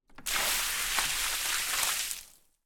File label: household water pour from plastic mop bucket onto ground